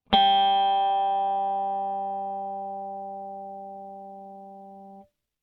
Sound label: harmonic wrong